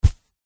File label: footstep single walking on thin fabric; zapsplat